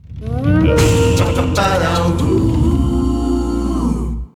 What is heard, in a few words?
Place Goal Fanfare; Fossil Fuel Injection - 2nd Place Goal Fanfare; crash bandicoot series sound box